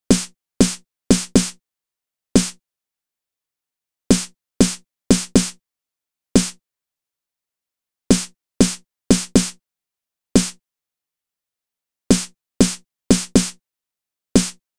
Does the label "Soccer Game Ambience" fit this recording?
No